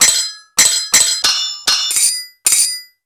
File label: SWHIT, SWORDHIT; Total Control - Action Cutz